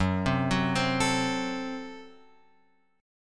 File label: F sharp Diminished; F#dim Chord {2 3 4 5 X 5} arpeggiated